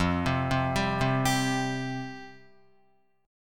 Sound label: F Suspended 2nd F Guitar; Fsus2 arpeggiated